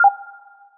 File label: timer tick; Stunt GP